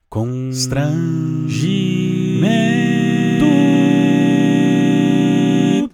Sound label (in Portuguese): ARRANJO VOCAL C7M(9)